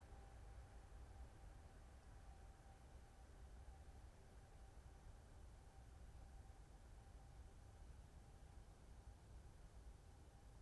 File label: yamanakako sound